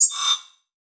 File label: Title Transition Electrical Pulse; Production Element Title Transition